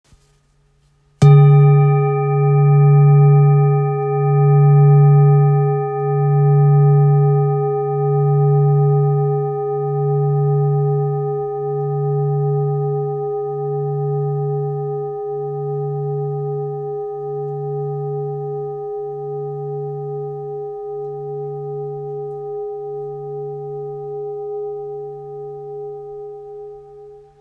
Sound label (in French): Bol full moon do diese